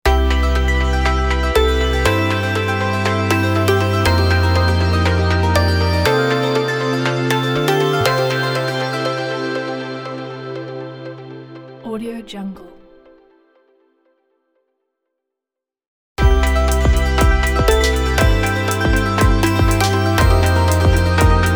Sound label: positive melodic logo